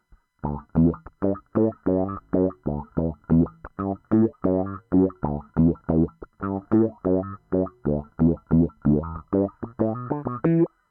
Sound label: wah mutron